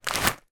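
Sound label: food popcorn kernels pack hard shake single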